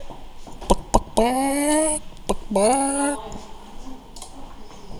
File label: chicken